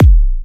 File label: Techno Kick